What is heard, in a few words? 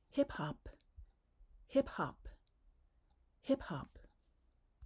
hip-hop